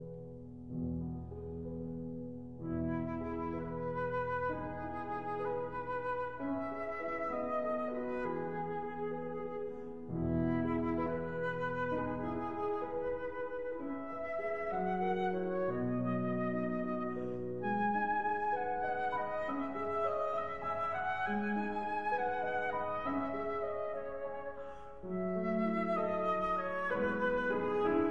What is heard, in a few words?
Berceuse (Lullaby) for flute and piano